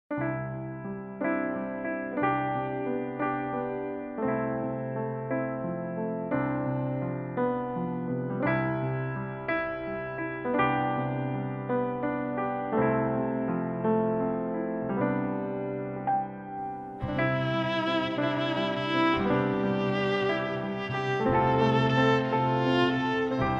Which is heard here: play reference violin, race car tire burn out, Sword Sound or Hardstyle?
play reference violin